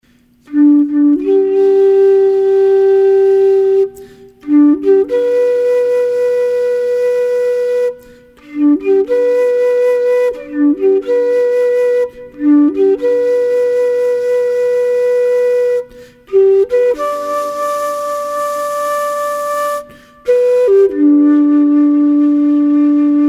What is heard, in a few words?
Taps